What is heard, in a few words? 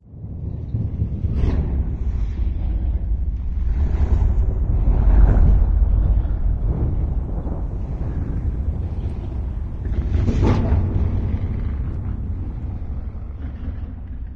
citadel outside fx; ambient; city; source engine; episodic